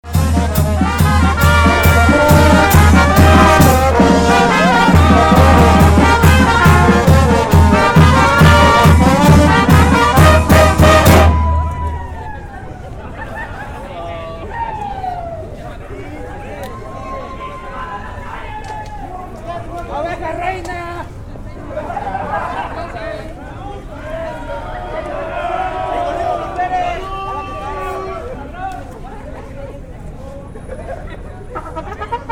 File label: street band LOUD